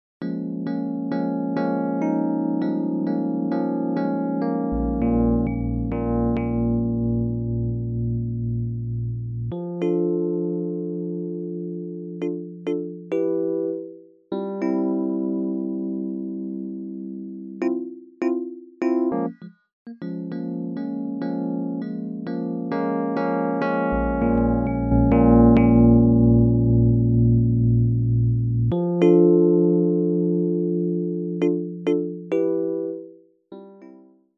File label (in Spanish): musica cortes piano lent